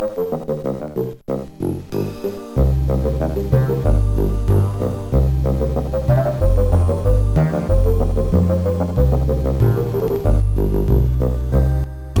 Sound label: oboe mania